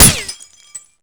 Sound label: glass bullet impact; Rust